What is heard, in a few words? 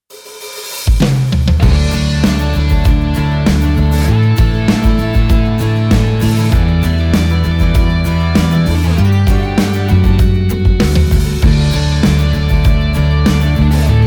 Corporate